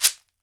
SHEKERE, African Percussion; E-MU Producer Series Vol. 7 – Old World Instruments

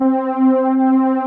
SMOOTH PADS, JV 1080; Pro-Rec - Synthsonic

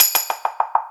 TAMBRIN, TAMBOURIN; Big Beat Samples & More; AKAI

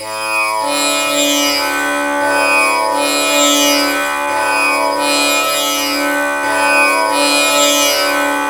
TAMPURA; Spectrasonics; Heart of Asia

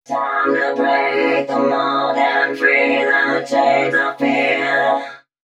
female c#, Dance Ultra Voice FX; Mutekki Media

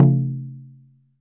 KENKENI BASS

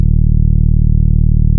TAURUS E2, synthesizer; Hollywood Edge - Giorgio Moroder Rare Synthesizer Collection

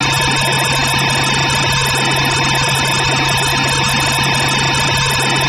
INSECT, Special FX Twisted; USB Soundscan; AKAI

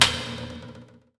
A#3 HIT, JAPANI PERC; Northstar; The Wizard VOL-1

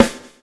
SWNG

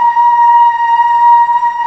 BOYS A#4, BOY C; MEL BOYS

